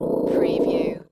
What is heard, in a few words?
Dog Chihuahua Growl